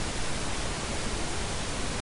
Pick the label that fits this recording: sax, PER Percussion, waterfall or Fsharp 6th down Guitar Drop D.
waterfall